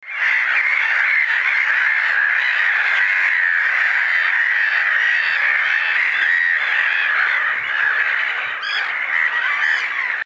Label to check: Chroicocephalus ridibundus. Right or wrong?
Right